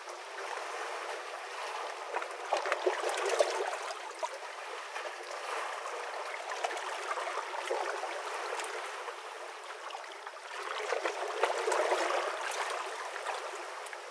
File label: lake water; ambient